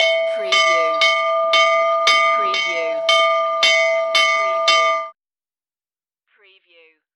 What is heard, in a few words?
Fire Bell School Interior